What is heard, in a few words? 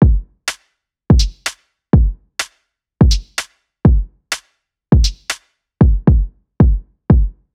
beat